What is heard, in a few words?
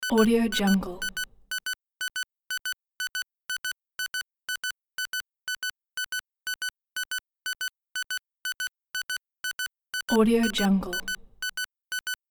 scroll data